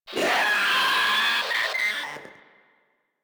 Proto Infested Runner Vocal Attack; Warframe

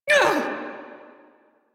Pain, Male; Dialog; Warframe; Railjack Crew; Perrin Sequence

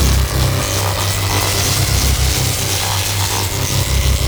Galleon Hazard Laser Beam Loop; Vor Galleon Fight

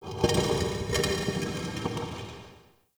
Stone Movement, Crypt Ambience; One Offs; Champion Crypt

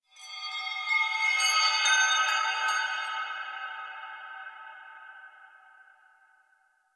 Chimes; Warframe; The New War; Narmer Outpost

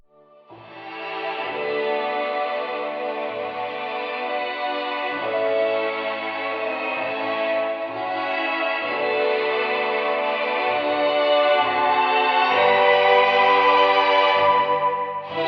Hug Music; Kalymos; Warframe; Nineteen Ninety Nine; Quests; Romance NYE

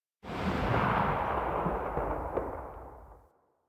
Heavy Grenade Launcher Tail; Warframe; Archwing

